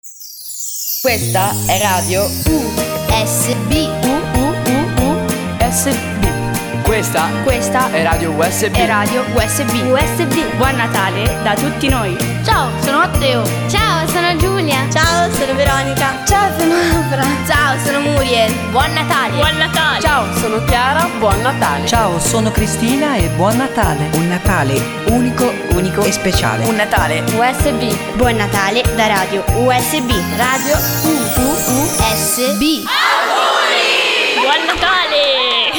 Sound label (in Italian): Jingle Natale